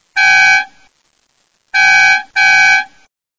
car horn

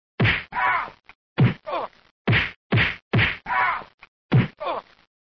kick boxing

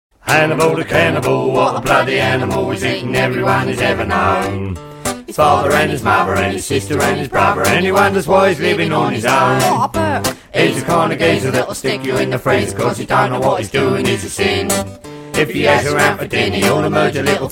Fun Music

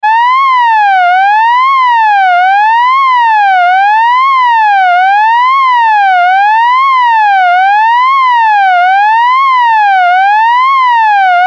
Digital Police Siren

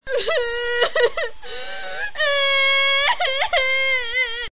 woman cry

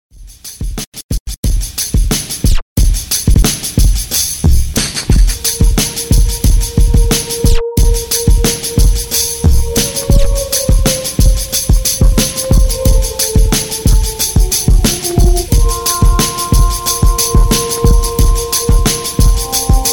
Hip Hop Beats

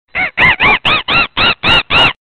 Monkey Sms

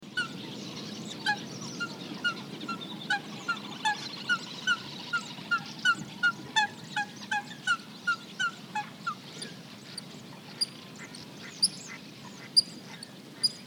Fry Lamb

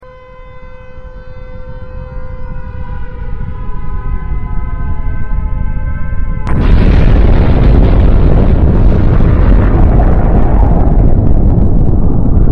Nuclear Explosion Full

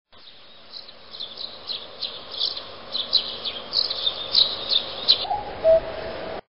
birds singing in the forest

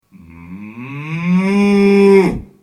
Animal Cow

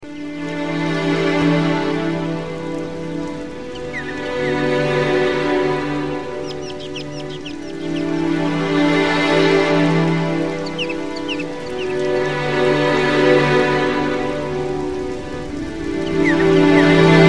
Bird And Nature

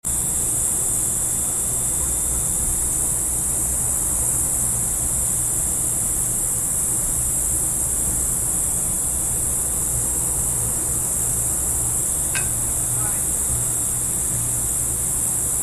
Bug Light Tennis Bball Rballcrt; Noisecollector

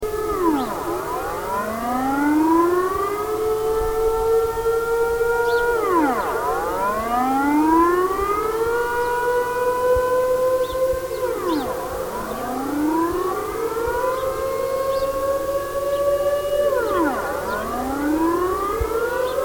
Laser Effects Sound

